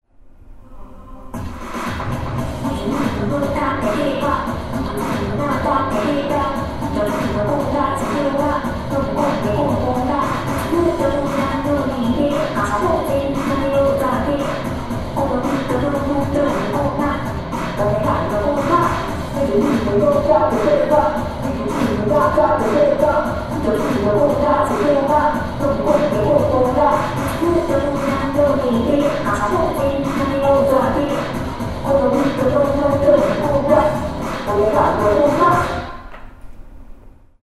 vocaloid; sinsy

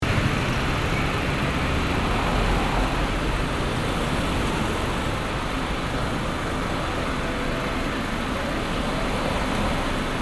car

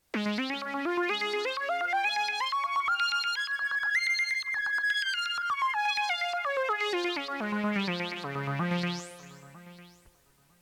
scale

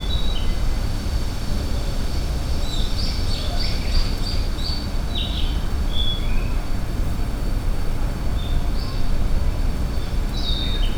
bird

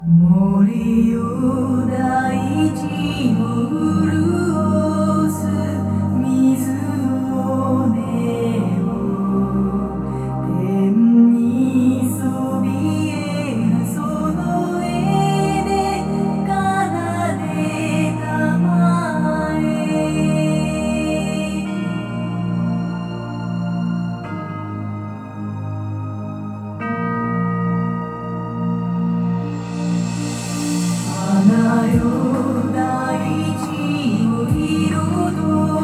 ms music

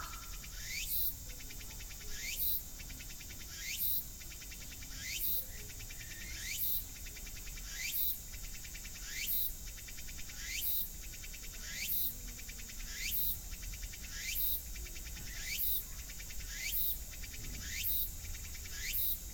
omni cicadas

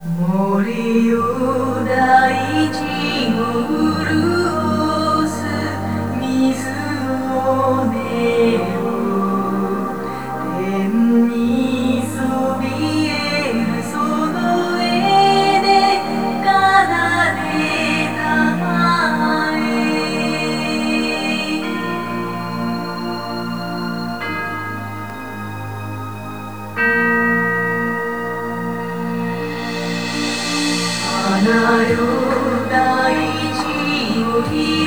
music

